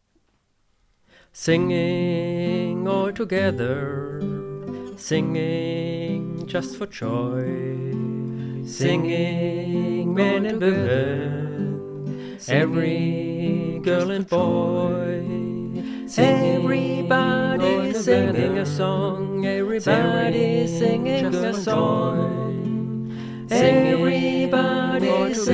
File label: Singing Altogether